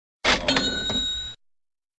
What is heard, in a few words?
Cha Ching